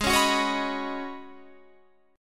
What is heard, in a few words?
Db 7th Suspended 2nd Suspended 4th Ab, chord shape